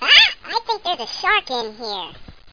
shark

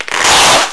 DELETE; LOTUS

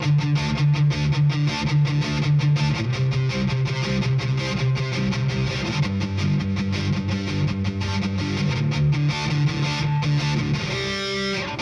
Guit; Metal Zone

